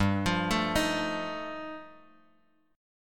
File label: G 7th Suspended 2nd Sharp 5th G, Bass Standard; G7sus2#5 arpeggiated